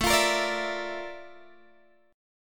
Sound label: Bb Major Sharp 11th A, chord